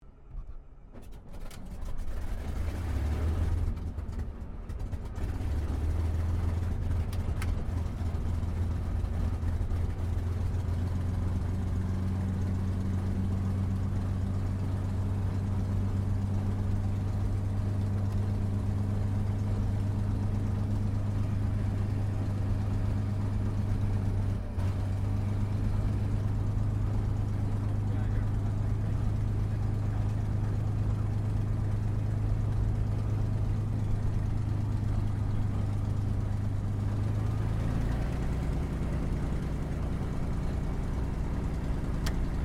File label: AIRCRAFT CAC CA-18 MUSTANG MK23 STARTUP IDLE INTERIOR; CA-18 /P-51 Mustang; Airplanes (Sound Effects:Vehicles)